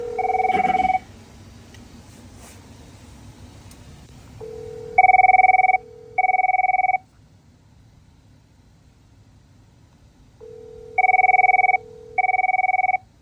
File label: phone